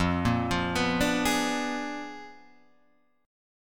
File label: A sharp 7th Flat 9th F